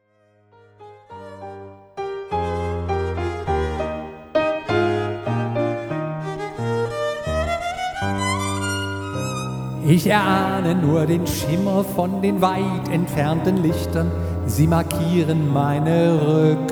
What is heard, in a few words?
tango canzon